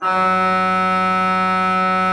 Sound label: F3, Cathedral Organ; ILIO; Propeller Islands